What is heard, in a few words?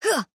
Attack Small; Arya